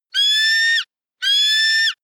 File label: eagle african fish call; natur tiere; voegel